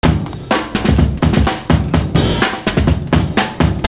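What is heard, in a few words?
60 S BEAT, 126 bpm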